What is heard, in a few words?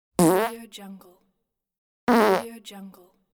farting